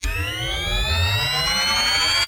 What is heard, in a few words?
police car weapon power ext; Original TK Sounds